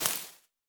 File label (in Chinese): 蜘蛛网破坏声